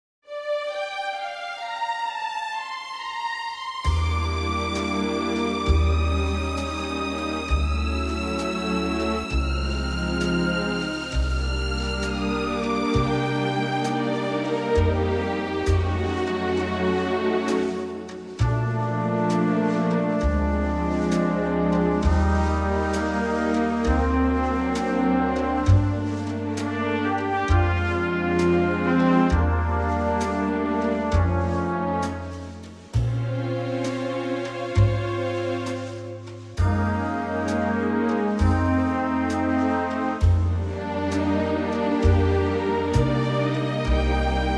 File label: Bb orchestral